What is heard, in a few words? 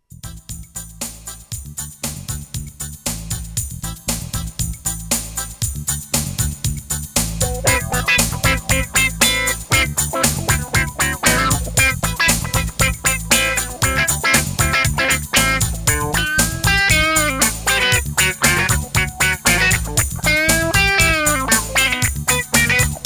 reggae